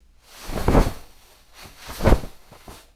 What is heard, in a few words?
heavy fabric bed sheet rustling; cloth